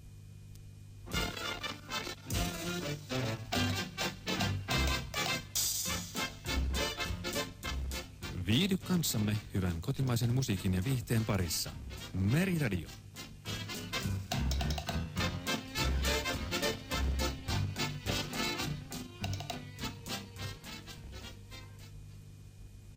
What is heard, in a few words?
jingle